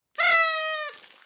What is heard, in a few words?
Party Horn